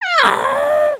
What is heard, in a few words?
disappointed; emotes